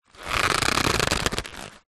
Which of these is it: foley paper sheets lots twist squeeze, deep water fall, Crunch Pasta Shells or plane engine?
foley paper sheets lots twist squeeze